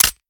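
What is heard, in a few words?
pistol reload empty fast extended mags slide release; gmod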